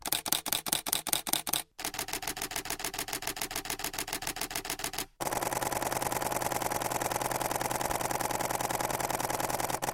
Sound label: Canon EOS Rebel T6i 80D 7D Mark II Burst